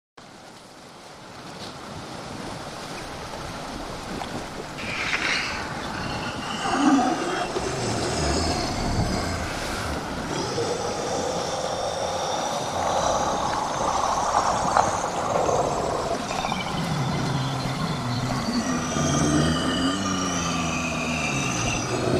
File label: Sea Monster, A large groaning and hissing scary sea monster with sounds of the sea.; Monsters; Horror